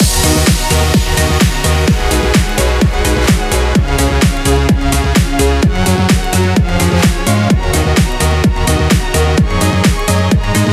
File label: EDM